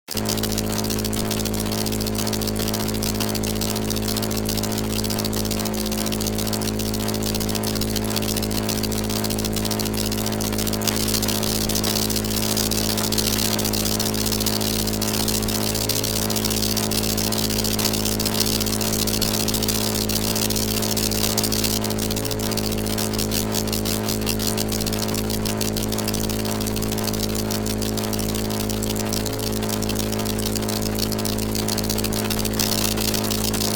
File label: Ground Electric Arc Machine; Electric Deluxe